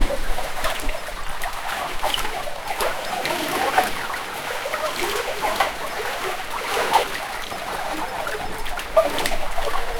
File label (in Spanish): persona nadando en una pi, pool